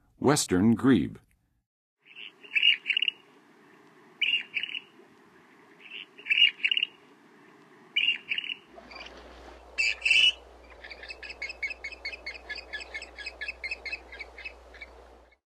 Western Grebe; North America; Western USA; Western USA Songbirds

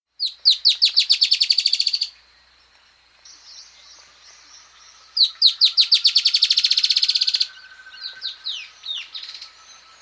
Dusky-Tailed Antbird, Nature's Songs, S. America

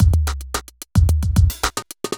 evil beats 110

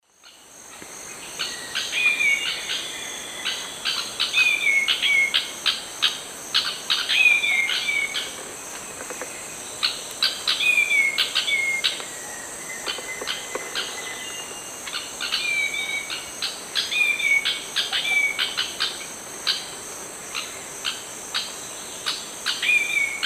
Habia rubica, calls and song 2 birds; S. America; Eastern Lowlands

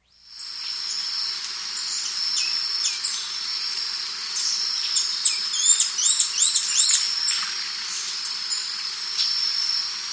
Black-And-White Becard, Natures Songs; S. America

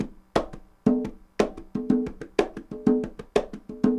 conga 120 bpm, latin; Sound Effect Library

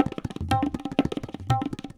tabla 120

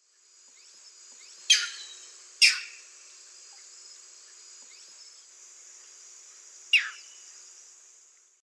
Butorides striata, call; S. America; Eastern Lowlands

